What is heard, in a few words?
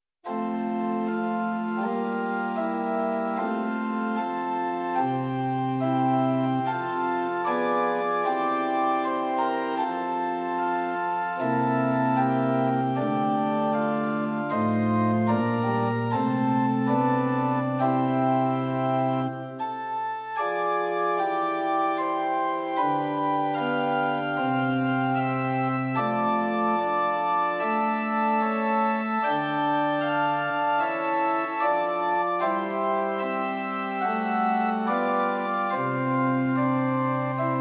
Liturgical Organ Pitch 440 Temperament Equal; Prinz 8 4; hymn